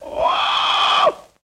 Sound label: Parrot Call Throaty